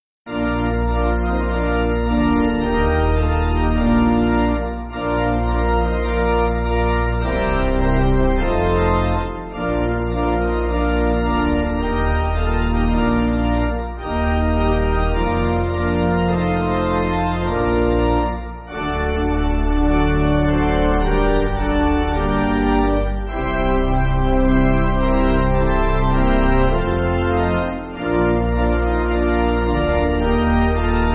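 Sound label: Pipe Organ, Free Music Backing Files for Churches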